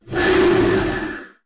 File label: Hiss; Barotraumatic; Razzershrimp